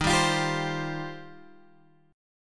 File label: Eb 6th Add 9th, Guitar, Open G; strummed; 13,12,15,12,13,x